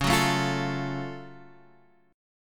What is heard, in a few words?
Gb Minor Major 7th Db